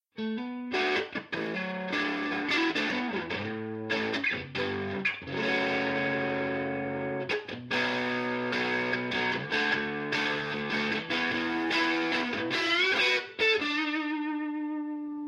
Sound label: overdriven